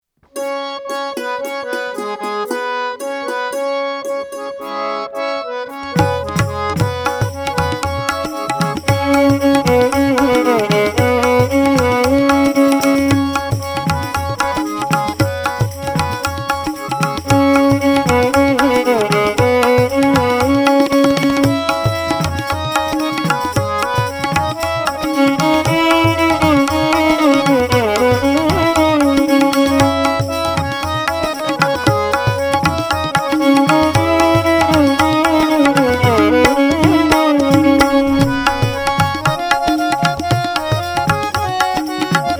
Ladies Karaoke C Sharp Pancham; Sai Narayana Narayana

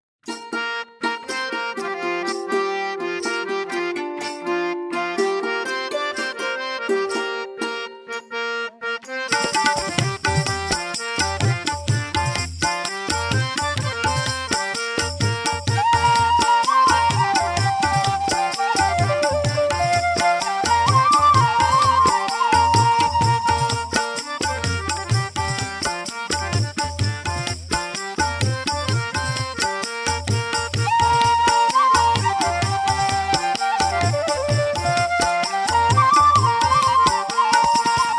Gents Karaoke A Sharp Pancham

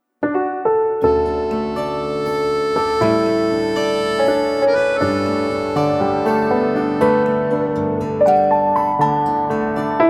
drama theme music; PremKiPukar

